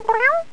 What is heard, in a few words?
meow; moSounds MacOSX